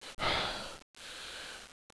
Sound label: Female breathe fast